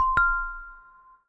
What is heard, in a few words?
ring, notifications; Infinix Smart 2 HD